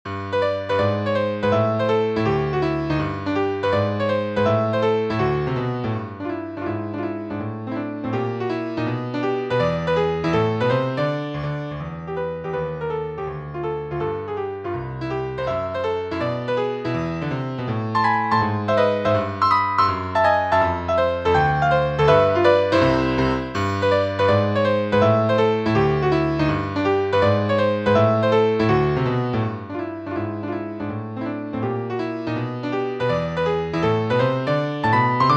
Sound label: Jazz Impromptu